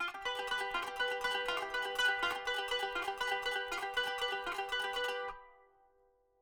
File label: kanun